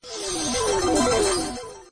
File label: big fish die